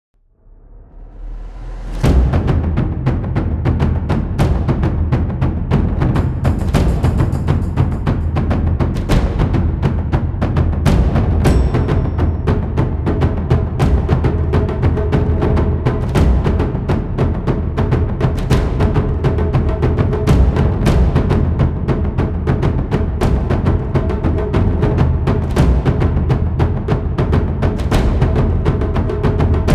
Epic Drums Powerful Dramatic; True Blades

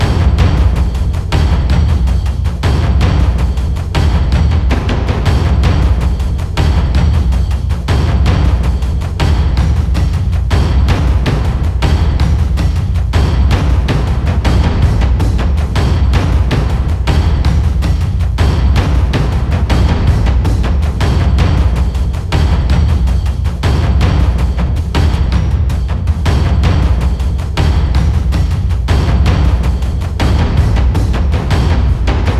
Robo Bear Attacks Drums; B3 Game Expo for Bees; wii u

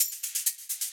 Electronic 130bpm; hot lava; ios macos windows; Kilimanjaro Course2

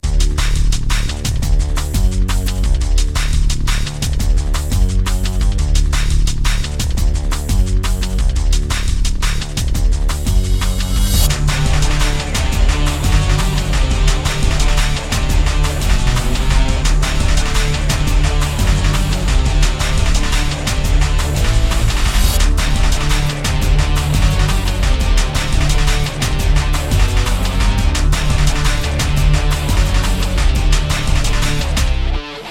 BGM mini game; virtua tennis world tour psp 2005

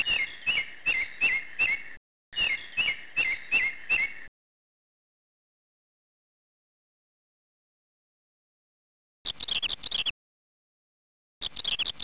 birds; Harukanaru Augusta; X68000

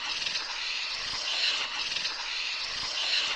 Marker Sound; The Looker

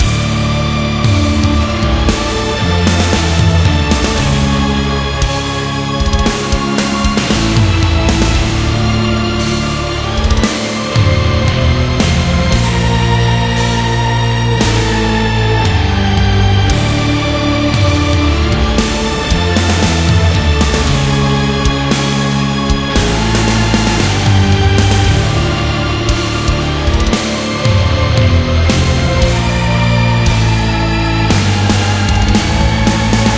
bgm loop; naruto ultimate storm android ios gamerip 2016; naruto battlefile bgm yanyincun

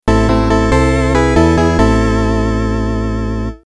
Sad Occasion

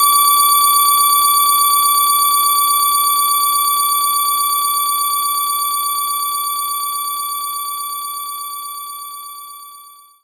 Organ Slow C4; knights try

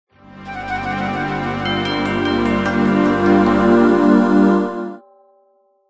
Magic level up

